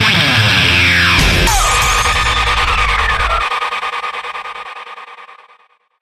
Big Blaster Sweep Drone

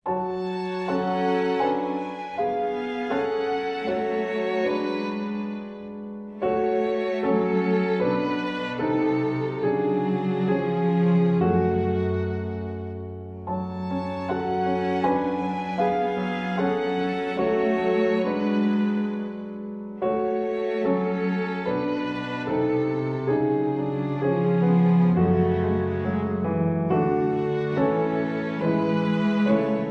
With Strings

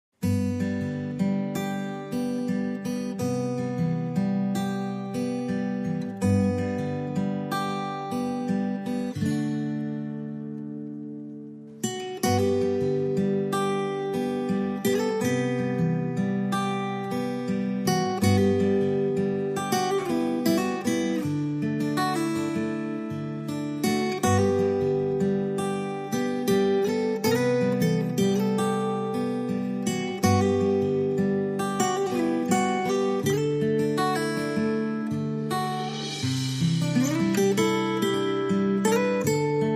Guitars and Light Percussion Only

